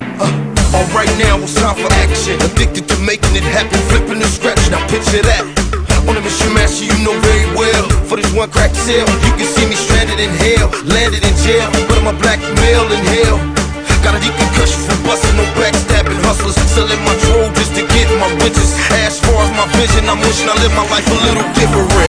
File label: allegro; stasi sounds